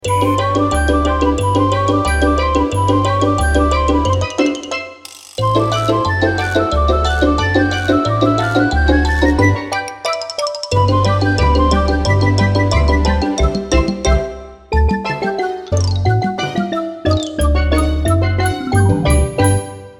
encounter lass; Manamon Music; Manamon 2

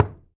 wall building; Golden Crayon Sounds

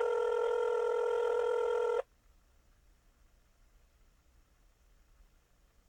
telephone waiting; Golden Crayon Sounds

